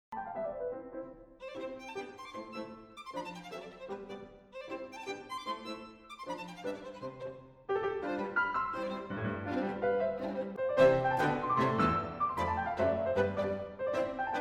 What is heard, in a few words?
Piano Trio